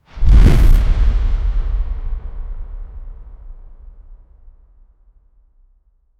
viking hmmmm impact

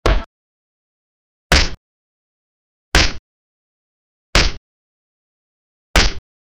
a fury of punches followe, bone crack

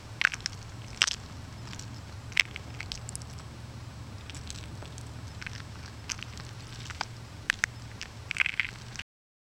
the low rhythmic grinding, monster

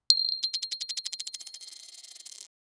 a coin drops in crystal

